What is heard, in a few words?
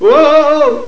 WOOAH!